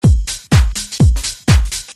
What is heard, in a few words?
GARAGE, beats